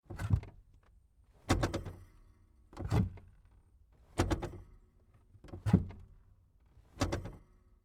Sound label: suv clutch